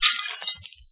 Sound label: glass break